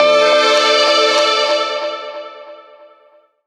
RAW STRING; Rawcutz; Nu Forms

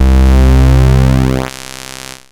RAW PULSE, ARP, OLD SYNTHS; Rubber Chicken Software Sample Bank

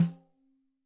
PAN THM, Percussion; Sampleheads; NYC Percussionworks; ST PERC VOL2

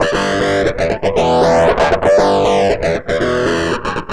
FX DIST; FX DISTO

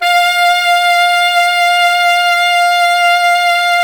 SAX 2 Altos F 5; Alto Sec

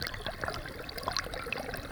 Waterway E Dark

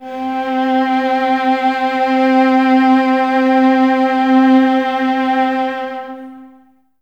violas C 4, String Ensembles; Miroslav Vitous; VLS 1+2

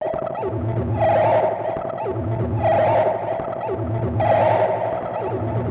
Crude Tape Loop, Tape delay; radiosonics

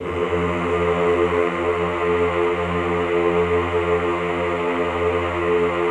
EHH F1, Classical Choir; Best Service; Extended Classical Choir; EHH LO+HI LL

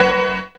ZEN CHORD; AMG - Vince Clarke Lucky Bastard

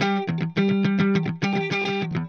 Clean Funk Guitar

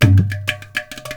INDIA L; Spectrasonics - Heart of Asia CD2

